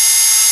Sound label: Barrel Spin Loop, Machine Gun